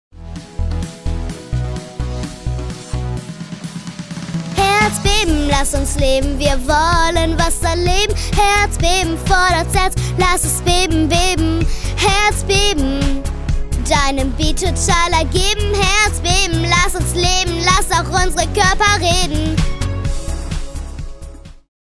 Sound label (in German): Gesang